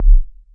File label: brain fart kick, electro FX